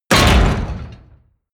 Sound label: explosion metal impact; explosionen; comedy-cartoon; soundpool